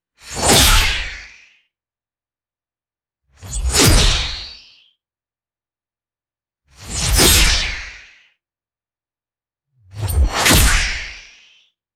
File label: sci fi energy whip swings and strikes; weapons